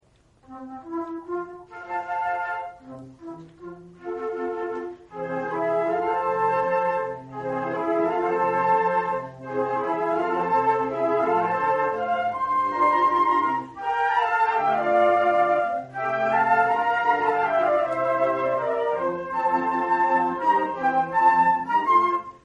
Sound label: concert, flute; Newyear concert 2015